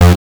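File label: Saw Lead Low F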